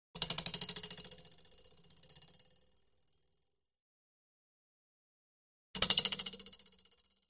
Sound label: Twangs Metal Slow Vi; boings / twangs / snaps